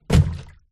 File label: plastic tub empty drop water; foley; zapsplat